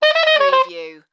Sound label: Sax Short Run Down